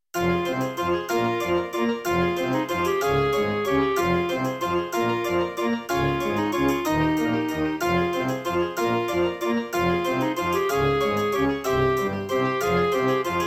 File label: Track 6, Roland SD-90 GS MODE; Death Gate